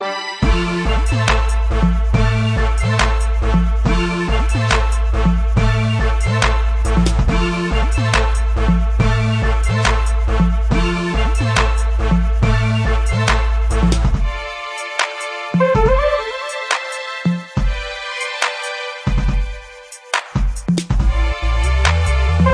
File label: orchestral